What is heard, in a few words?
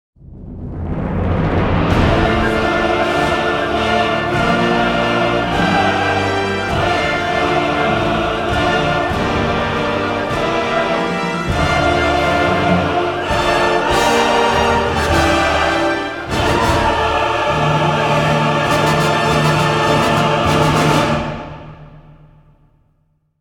Choir; Star Wars The Old Republic Rise of the Hutt Cartels and Shadow of Revan